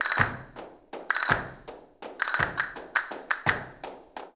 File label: CASTANETS, Flamenco